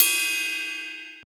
Ride; FLPRO

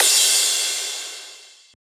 Crash

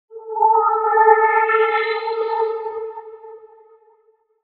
in Moving, Vocoder

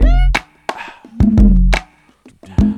Loop

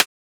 Transient; FLPRO

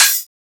Open Hat; FLPRO

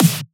Snare Clap; FLPRO

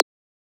Transient; FLPRO

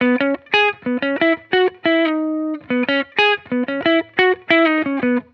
Electric Melody Loop 180 BPM E Maj; Cymatics

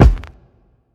Kick

